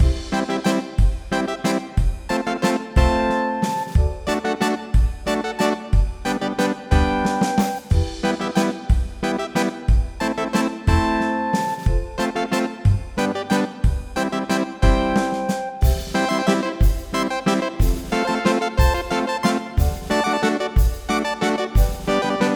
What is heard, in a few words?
The 8 Bit Climber, chiptunes